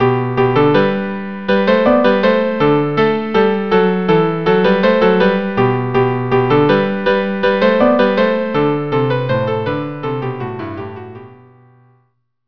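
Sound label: duo with cello; ronde saltarella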